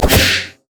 call of the void; LoL; alzahar

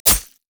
crescent slash; LoL; akali

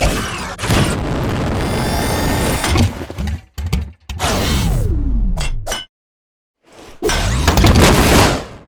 idle recall; LoL; heimerdinger base

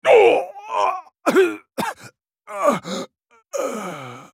dying; Garen; Character Voices - English

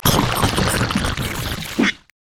drunken rage drink; LoL; gragas

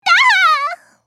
dying; Lulu

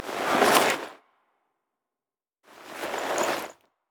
emote laugh; rengar

